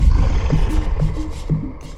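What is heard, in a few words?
Music Soft Ground 120; from dust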